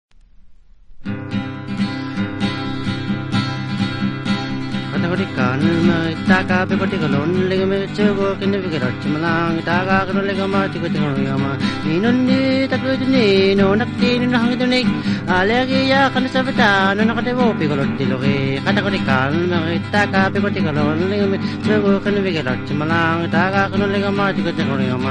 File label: Inuit Labrador